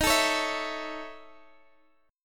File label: A Major D sharp; strummed